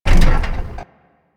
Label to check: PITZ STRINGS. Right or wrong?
Wrong